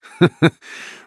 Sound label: laugh; mccree